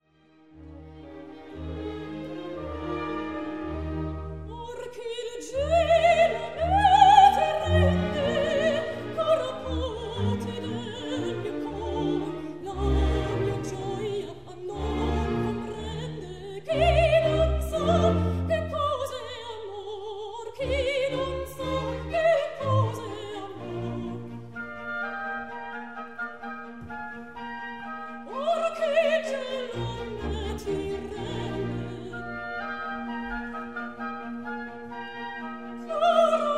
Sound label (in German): mozart arie